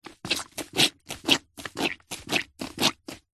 household cleaning mop in bucket little water squelch; zapsplat